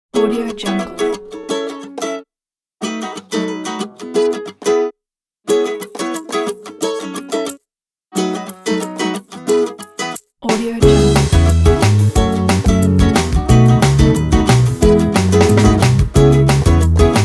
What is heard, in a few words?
happy upbeat